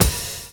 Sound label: DRUM CHOP; AMG Gota Yashiki Groove Activator